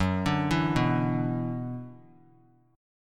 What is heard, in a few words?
Gb 7th Suspended 4th Sharp 5th Gb; arpeggiated; JGuitar